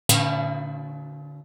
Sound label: gong, Cartoon Film Effects